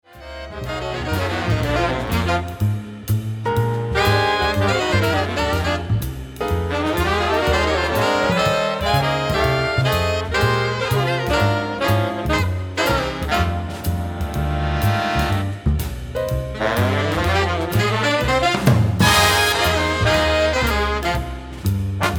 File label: blues